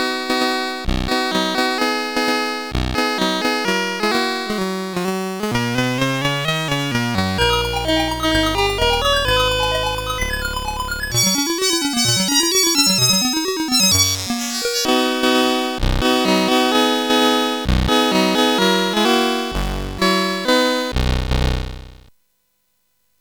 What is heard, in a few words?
Tornado Low Level, CSG8580R5; hvsc